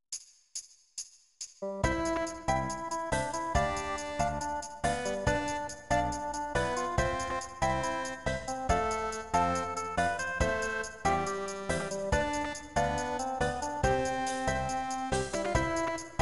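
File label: guitar miss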